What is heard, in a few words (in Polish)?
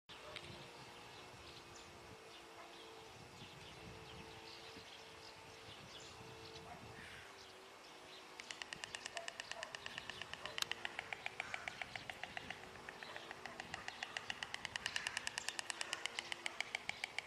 Bocian odgłosy